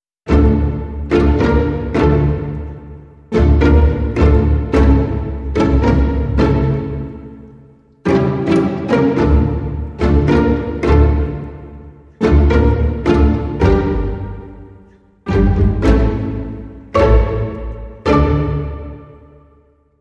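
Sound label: Hybrid Sting, Orchestra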